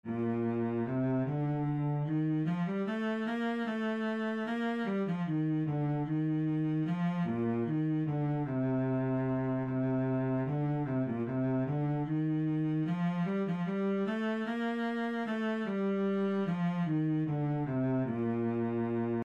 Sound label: minuet cello